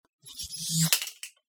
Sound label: holoemitter off and drop